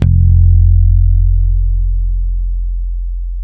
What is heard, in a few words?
bass, F 2; Spectrasonics; Bass Legends CD 1